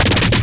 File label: bass purge